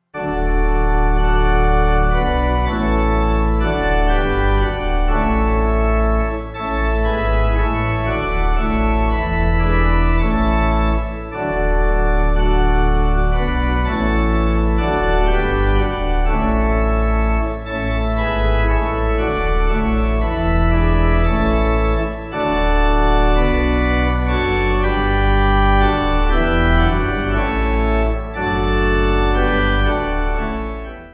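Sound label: Pipe, Pipe Organ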